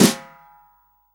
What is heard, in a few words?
SD, REAL DRUMS, SWING KIT